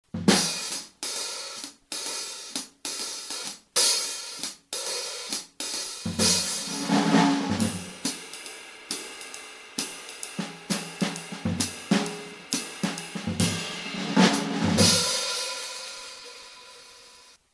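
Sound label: hi-hat mid beat, drumline; ISTANBUL; SPECIAL EDITION; 22R 14HH 21R(CRASH)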